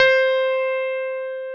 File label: CP-70 C4; E-MU Formula 4000 Series Vol. 5; Protozoa; Vintage Presets